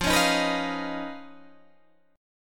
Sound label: D sharp 7th Suspended 2nd Sharp 5th F